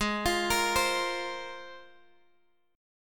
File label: Ab 6th Add 9th Ab Guitar DADGAD